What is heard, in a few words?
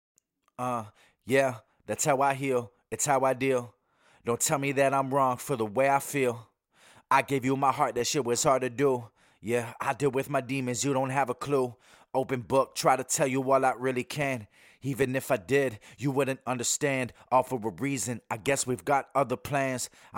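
hip hop 90 Cm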